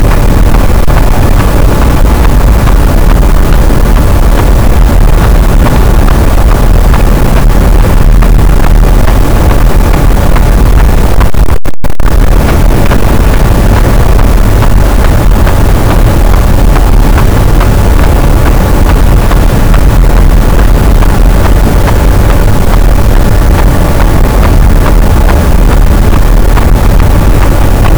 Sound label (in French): cachalot, gaussian noise; Physeter Macrocephalus